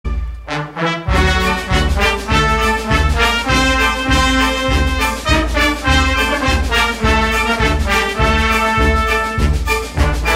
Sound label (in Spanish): Saxo